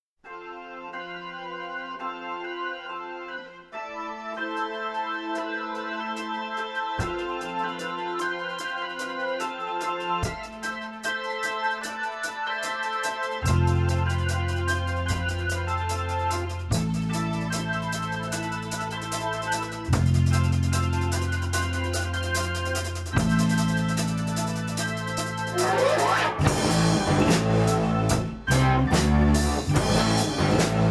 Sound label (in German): Instrumental